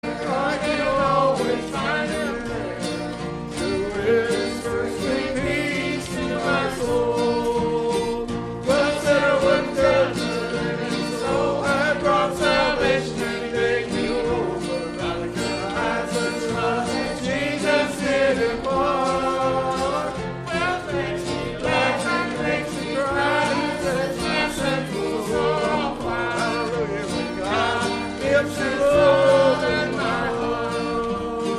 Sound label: Choir